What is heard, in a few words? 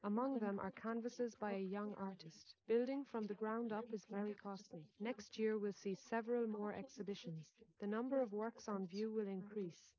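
female, instantaneous mixture; SiSEC11; underdetermined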